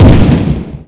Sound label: Turret; PC Gamer IT CD 48; Big bang